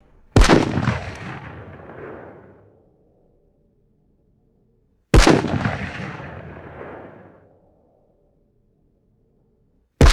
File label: distant handgun shots with airy decay